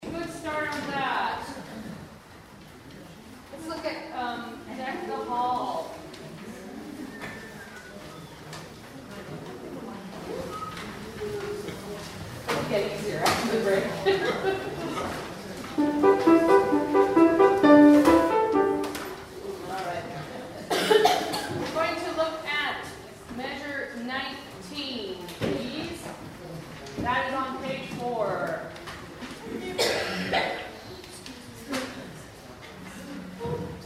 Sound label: deck hall